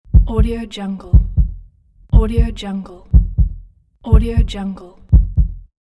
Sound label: heartbeat